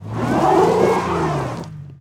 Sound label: Auto Tires Peelout Ice; Automobiles